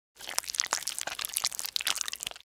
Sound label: Cartoon slime bubbling wet gloop movement